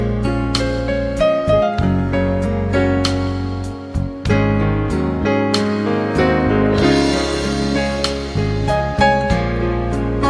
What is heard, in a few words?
instrumental